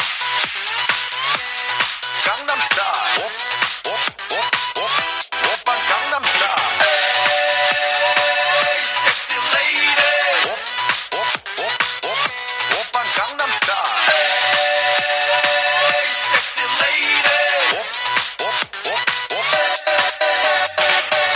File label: High bass, special effect